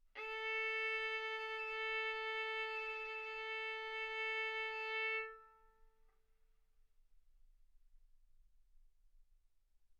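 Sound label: Violin arco mf A string A4 B4 stereo; sul A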